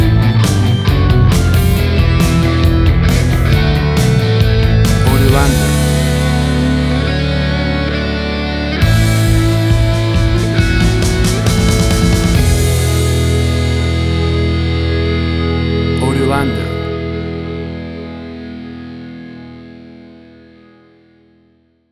90s Classic Punk Rock Music

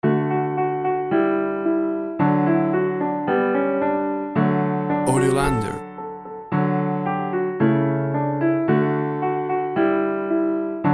Waltzing Matilda Piano; A beautiful Piano arrangement of the well known traditional tune

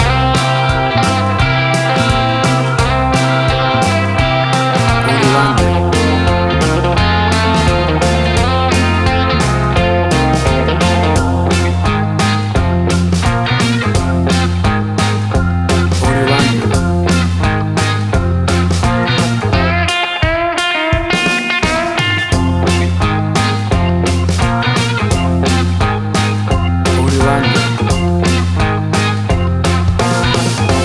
60s Mystery Man

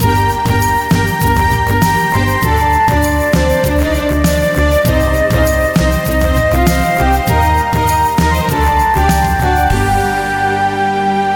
Arabian Sea, An exoti; An exotic and colorful piece of World music. Groovy, powerful

